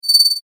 multimedia rollover, Multimedia Rollover Tone

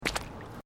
Footsteps Boots Asphelt Puddles Step

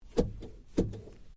Seatbelt Lock, car; Hyundai Accent 2008; Hyundai hire car; Vehicles

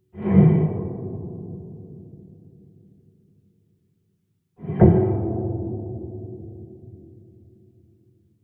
Concrete Doors Movement, Stone Door Sound Effects

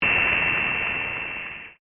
Sci Fi Ray Beam, Cartoon, Vintage; Cartoon Sound Design; BlastWave

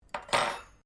cutlery general place bench, Household cutlery; Kitchen

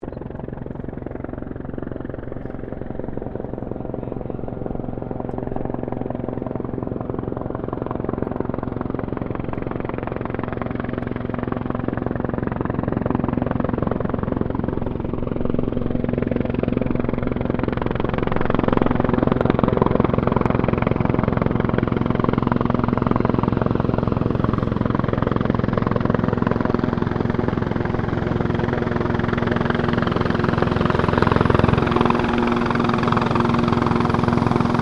helicopter Sikorsky S76 rescue pass land; Aircraft; Raaf; Helicopter Sound Effects

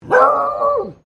Animal Dog Beagle Female Bark Close

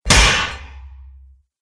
Long Sword Impacts Armor; Sword Sound Effects; combat

